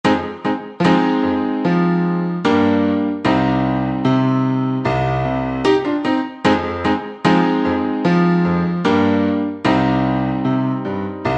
chill beats Relaxation Rhythm

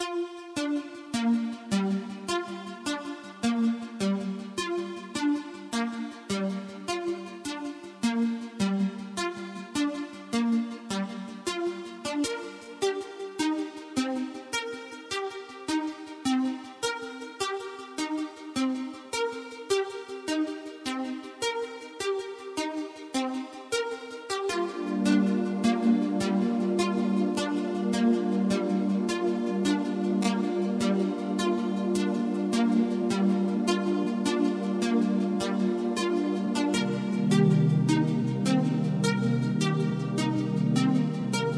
enigmatic dance 432 HZ

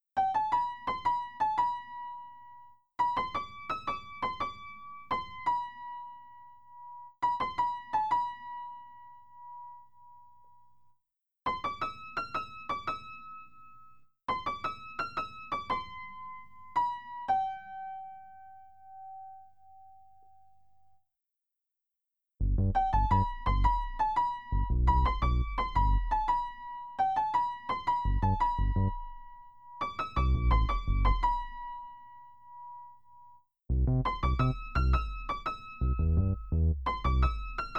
fitness music for stretching, the piano, 432 hz

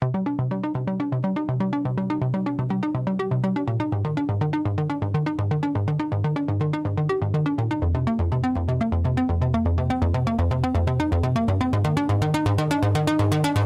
Electronic Impulse

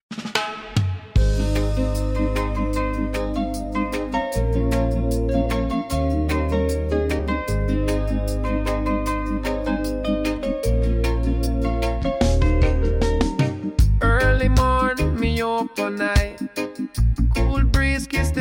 reggae

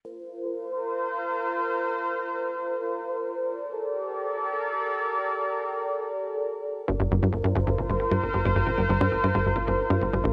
Electronic Impulse

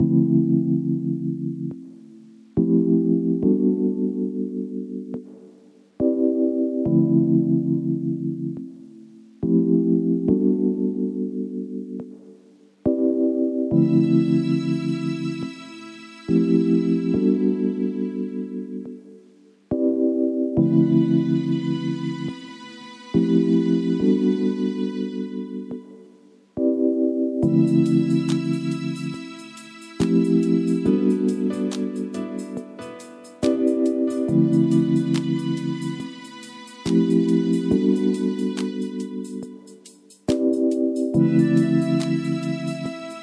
smooth jazz cafe

